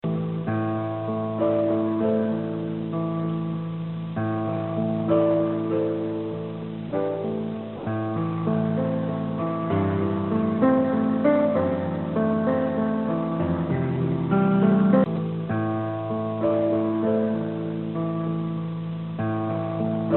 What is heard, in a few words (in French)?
Musique D'attente; Anuaire Inversé; Telecom